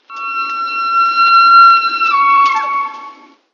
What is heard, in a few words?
Elk bugle